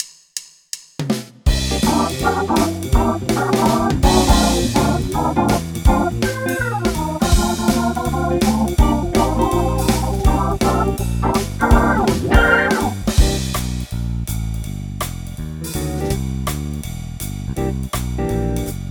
Organ

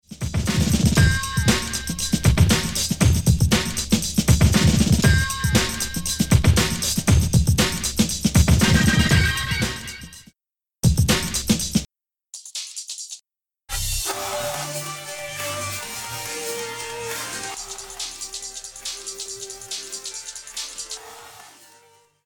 Break

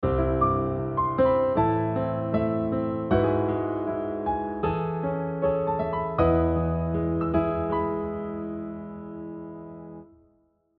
modulation from Cmaj to Emaj

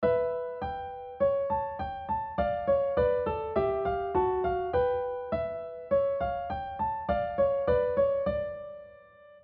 bitonal C and D major

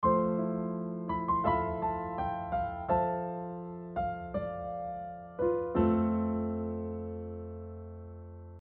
F lydian; A melody over an F maj7 chord – sounding like F Lydian

